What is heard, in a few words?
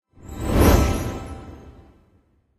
line combo; tetris